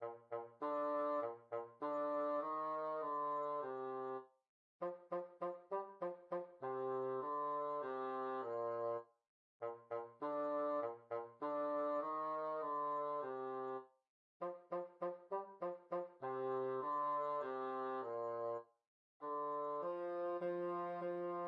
bassoon

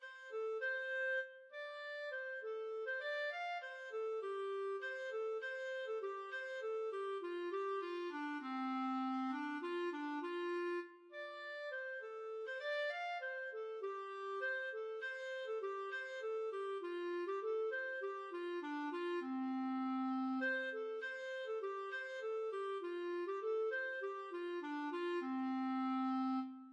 Cowboy clarinet

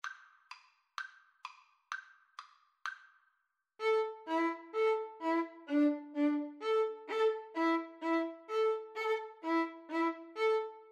tarantella violin

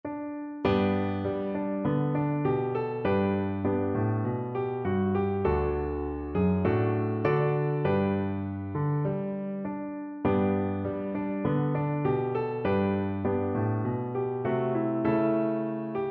albanian PNO, piano

